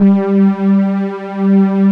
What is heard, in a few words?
SYN KEY SOFT; Pro-Rec - Synthsonic; Z1 PADS